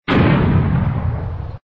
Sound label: Special Bomb